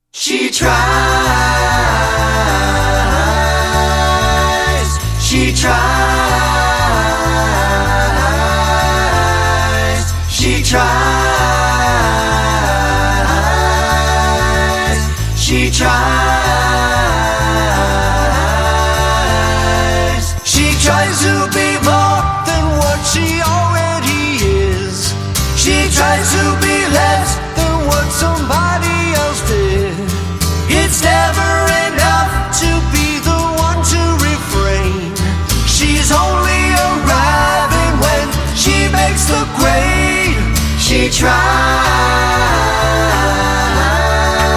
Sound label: Vocal Mix